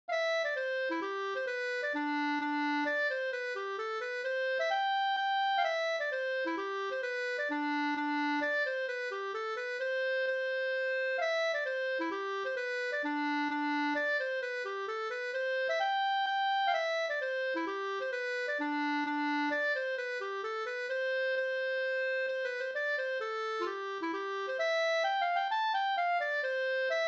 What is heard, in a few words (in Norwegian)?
pols; fr no u Claes Hagstrom